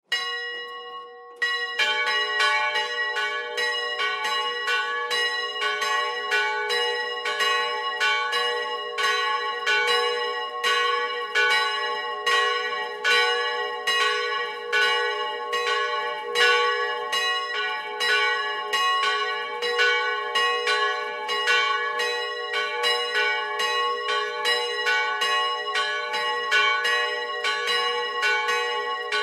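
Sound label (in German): Glockengeläut Kirche; Oberrod; Romröder Kirchen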